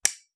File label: switch, UI Audio; Kenney's Sound Pack